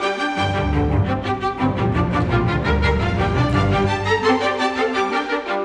Strings; Sonic Foundry (Sony Creative Software); Orchestral VOL-2; Modern 09

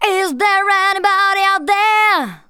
VOCALS SUNG; Techno Trance Essentials

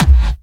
hard bass drumz

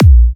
A#, Kicks; markus hakala

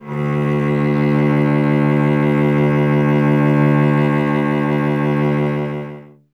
STR cello D, Cello; Miroslav Vitous; Solo Instruments VOL-1

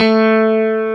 GUI STRATO, E-Guitar; E-GUITARS

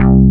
MH ANA BASS C2; SAMPLE WAVE MIXING 1

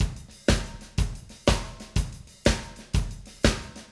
Acoustic Live Drum

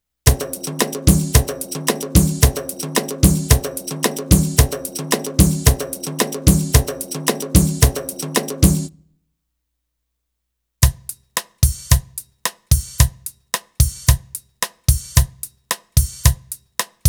Arabic Rhythms

